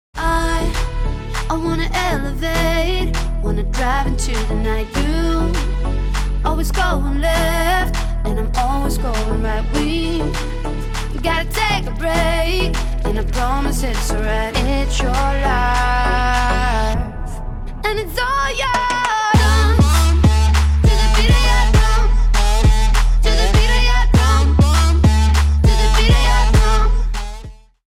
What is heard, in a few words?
Drum